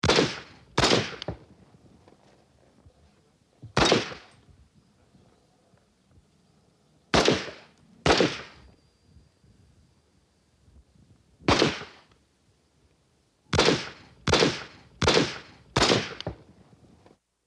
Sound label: japan gun